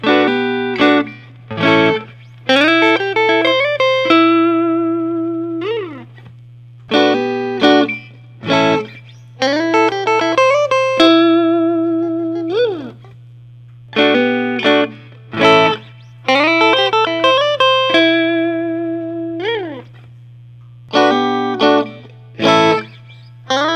fender custom shop; ww-10 cruz master built

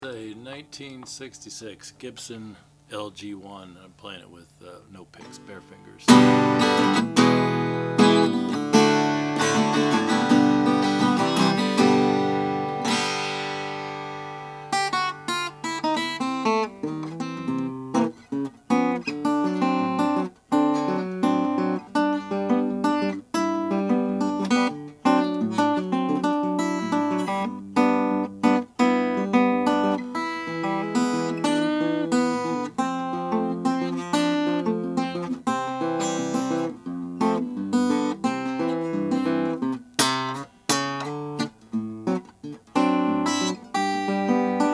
gibson lg1 red burst; 1966

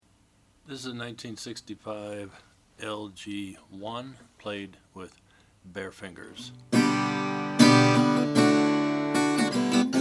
gibson lg1 redburst

